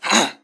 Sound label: Swing; doctor